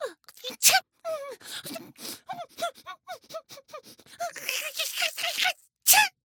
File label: FUN MONSTER Birdy wacky ill sick sneeze